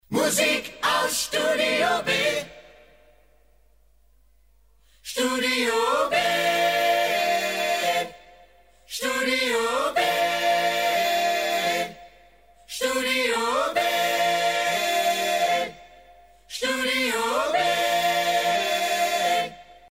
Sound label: Musik lang Acapella; DRS 3; studiob